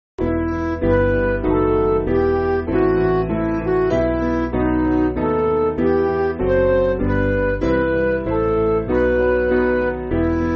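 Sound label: Midi, Music Backing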